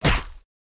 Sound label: Chop Impact; Caffiend